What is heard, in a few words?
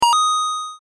coin, pickup; コインを拾う; sfxr